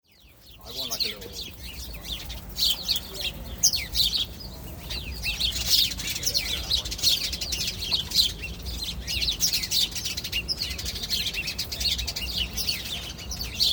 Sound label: Hedgeful of birds towpath, Regents canal towpath London